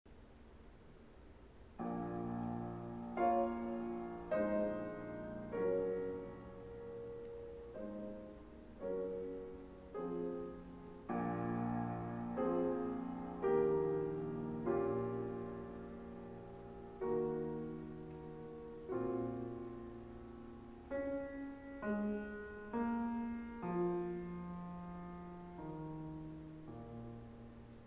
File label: Sonatina Piano; Petrich; M2